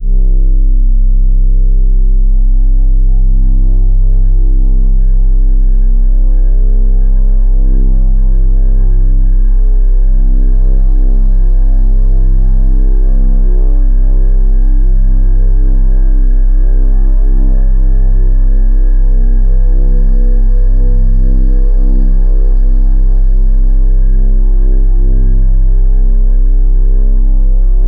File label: Horror Suspense Drone Texture